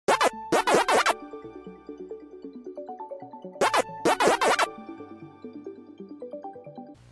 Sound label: Pacman Waka Waka Huawei